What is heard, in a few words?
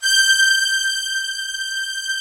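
string, orchestral strings; Greytsounds VOL-1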